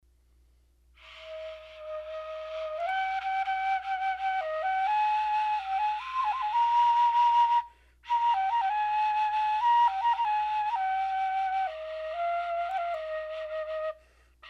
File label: flute tone soprano